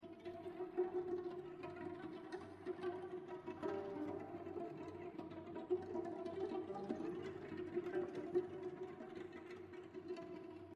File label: Leadup